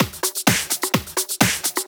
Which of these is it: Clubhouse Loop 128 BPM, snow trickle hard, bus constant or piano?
Clubhouse Loop 128 BPM